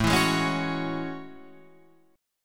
A Major 13th, down Guitar Open F; AM13 strummed

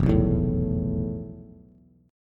F Major 7th Flat 5th Bass; strummed; JGuitar

